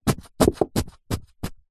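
mayonnaise plastic bottle empty squeeze just air slight squelch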